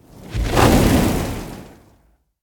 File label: Fireball, Fast Ripping Pass By; gun and weapon sounds; captain audio; fully loaded